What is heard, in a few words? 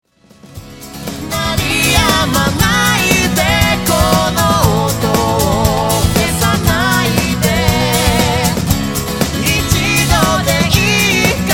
rythm sample